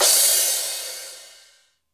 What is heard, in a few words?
Cym Big L&R, Drum Machines Alesis D4